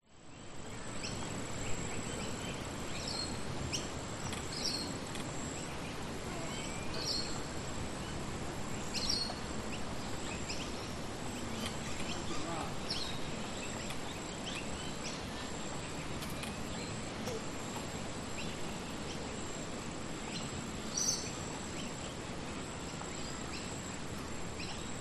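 public places park lake amb voices near birds insects wind trees distant traffic; cormorant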